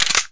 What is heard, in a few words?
flare gun bolt back; tf2